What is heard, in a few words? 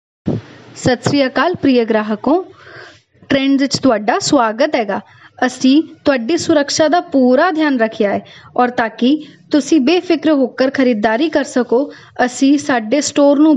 Punjabi Trends